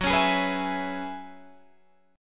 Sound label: Gb 9th Suspended 4th Gb down Guitar DADGAD, chord